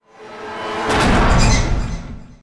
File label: Aggressive Car Attack; Lucius III; pc linux